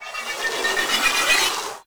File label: Draw Charge, bow; Daikyu Prime; Warframe; Weapons; Tenno